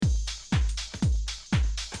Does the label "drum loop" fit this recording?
Yes